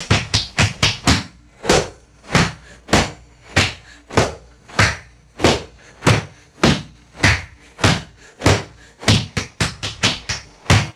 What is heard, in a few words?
slappy shoe stomps on linoleum floor; foley